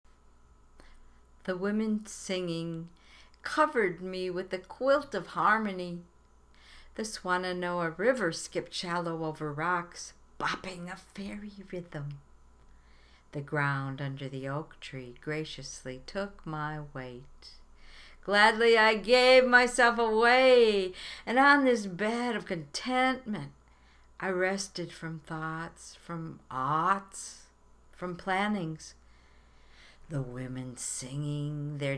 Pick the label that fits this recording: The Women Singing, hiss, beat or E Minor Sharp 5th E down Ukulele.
The Women Singing